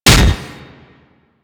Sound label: Impact Metal, Weapons; Warframe; Furax